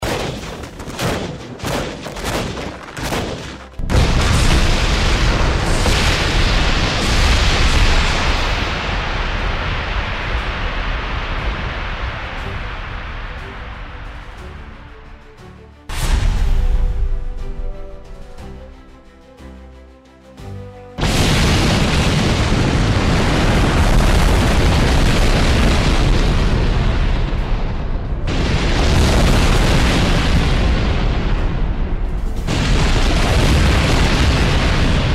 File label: Multible Missile launch